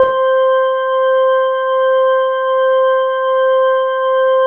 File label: C5, ORGANS; House Side Of 2 Step; USB Soundscan vol.51; AKAI